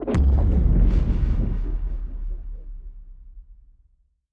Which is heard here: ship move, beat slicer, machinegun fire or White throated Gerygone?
ship move